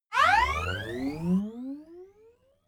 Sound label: notification message take off; kommunikation; signale